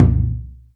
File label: PER, Percussion